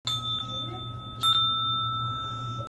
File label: Ding